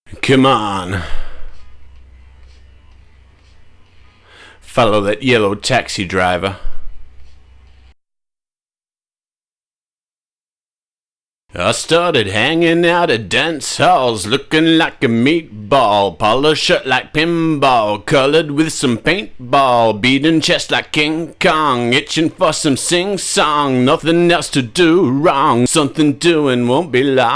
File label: pella